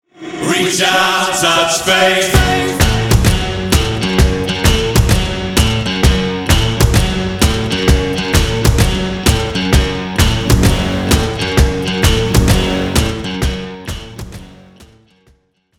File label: riff